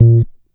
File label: HEY HEY BASS; Sampleheads - Ultra Freakin' Bass